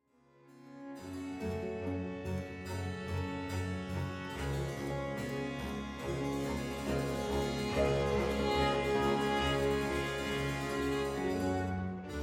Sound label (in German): B-Dur 2. Satz